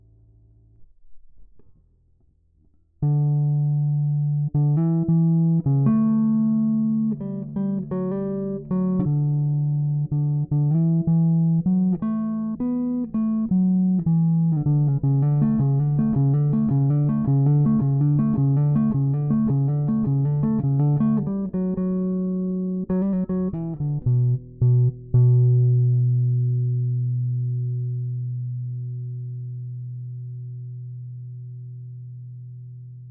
bass outro

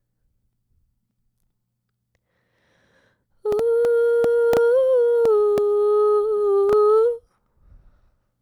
vox harmony

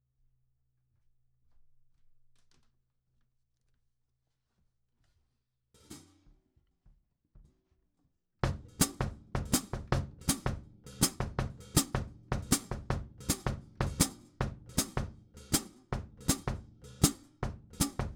perc bridge hat

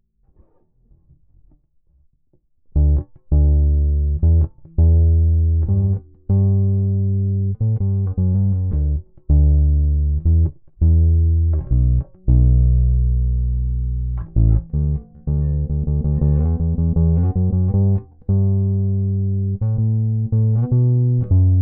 bass chorus

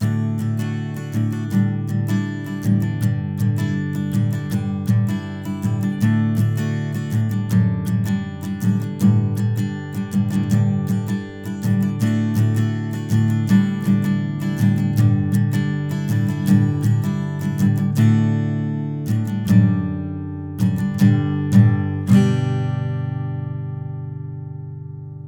outro

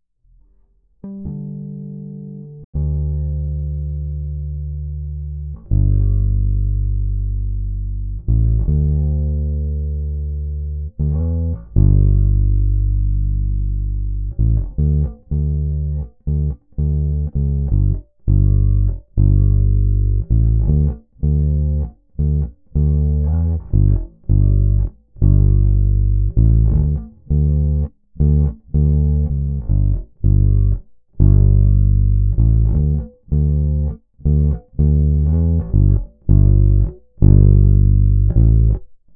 bass riff

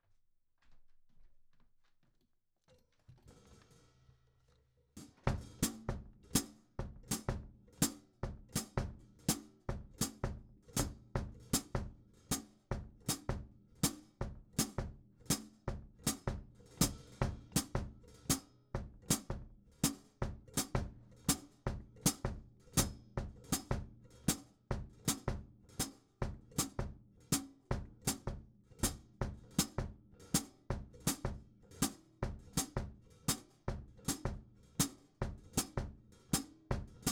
perc intro hat

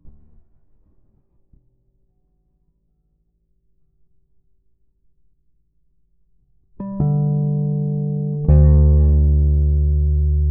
bass riff

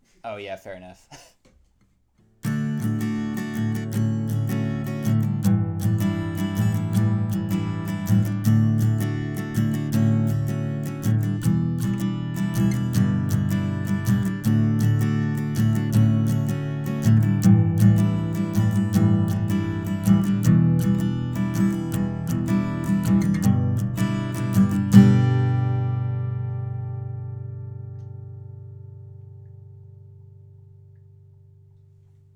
chorus strum full

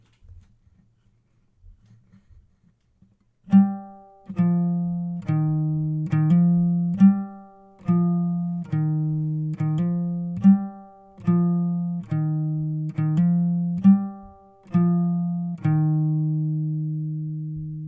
gtr outro

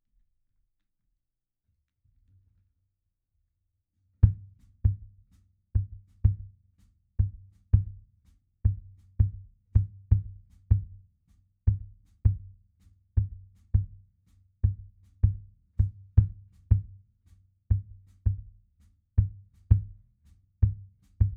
perc intro kick

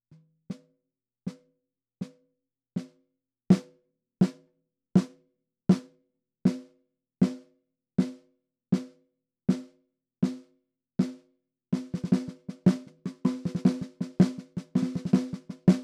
perc intro snare

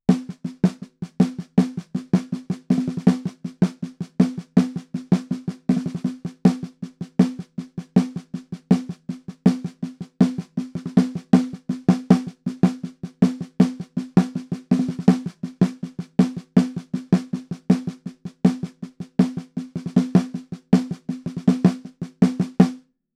perc bridge snare

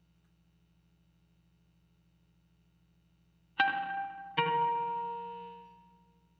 gtr texture

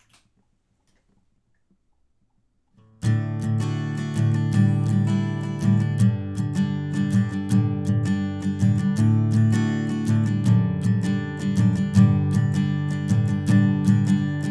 outro, with bass

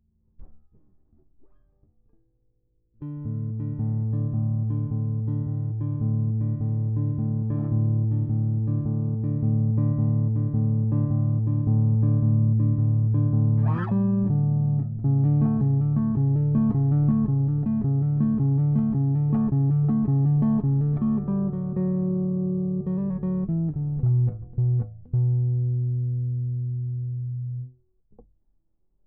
bass outro

